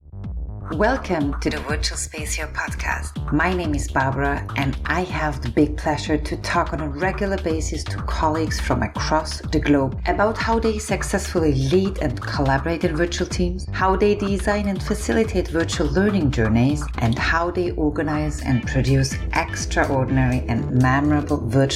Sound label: podcast intro; virtualspacehero